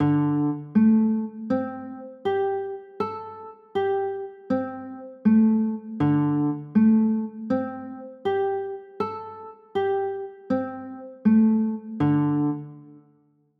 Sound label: d sus4, chords; d 7sus4